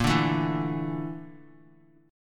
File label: A sharp Major 7th Flat 5th, down, Guitar; A#M7b5; strummed; JGuitar